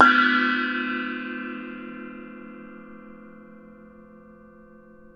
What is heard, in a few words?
NEPAL CYM, Asian Cymbals